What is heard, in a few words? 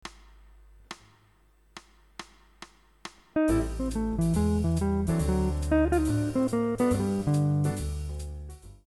ii V I Licks